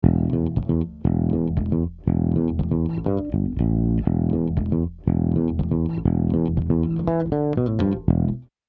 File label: IGS Audio ONE LA 500 E Bass Strong Compressor